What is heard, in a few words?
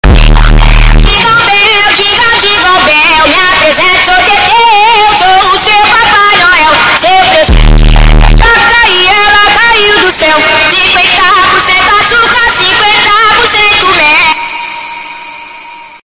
Dingo bell saturado Sound Effect